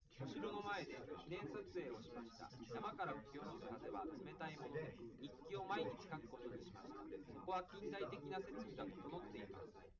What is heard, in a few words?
male live rec 1m sim; SASSEC07